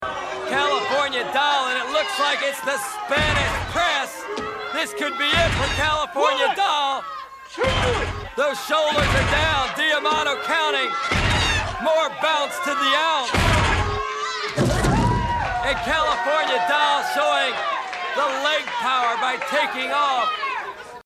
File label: glow sound effects